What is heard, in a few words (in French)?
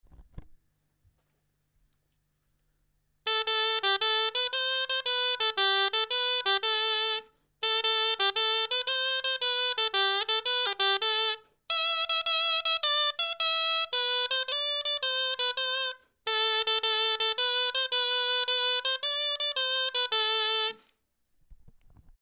clarin; Eths Caulets